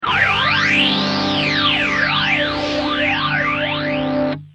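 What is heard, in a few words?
guitar Mutron biphase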